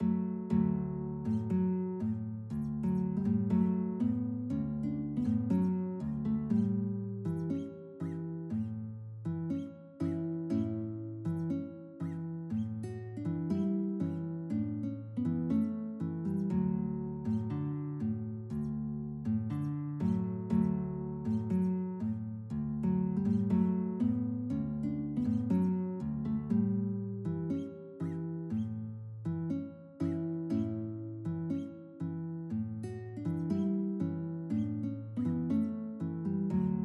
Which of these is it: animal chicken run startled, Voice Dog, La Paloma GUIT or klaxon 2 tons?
La Paloma GUIT